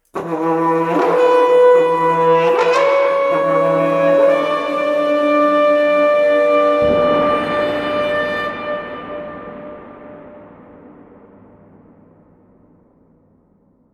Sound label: Cornu ensemble